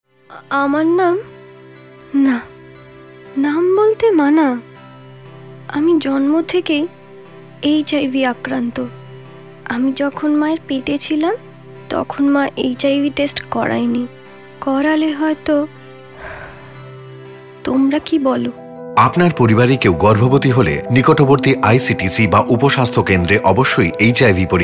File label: AUDIO JINGLE for Radio